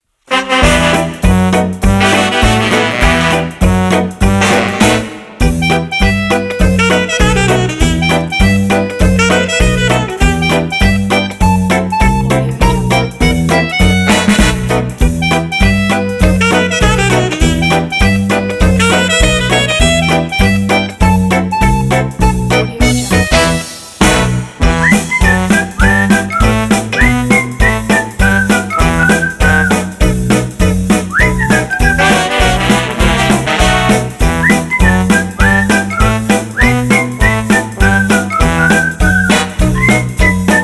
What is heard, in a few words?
swing band intro